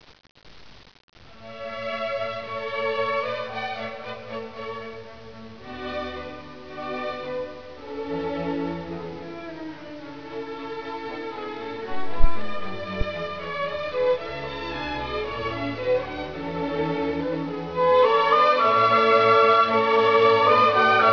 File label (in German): Klarinettenkonzert